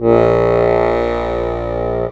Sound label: DIATON BA, AKKORDEON, Alpine Volksmusik; Best Service